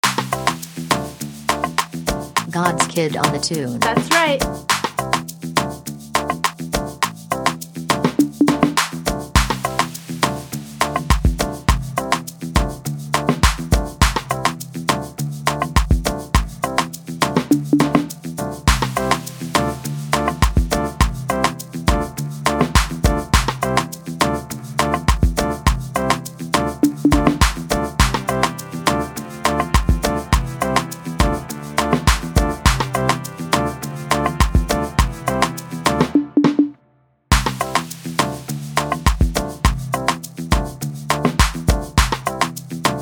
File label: Free Instrumental